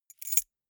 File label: key ring small place